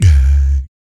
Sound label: VOC PERC, Vocal Planet Jazz FX BASS PERC